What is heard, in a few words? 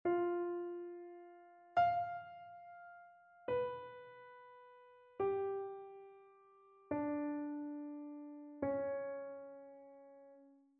interval chains